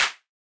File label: Clap; ANTENNAS